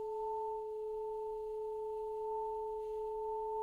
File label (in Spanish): Multiarmonicos